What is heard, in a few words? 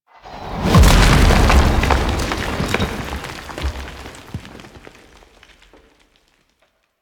Breakthrough Ship Explosion; Warframe; The New War; Teshin Intro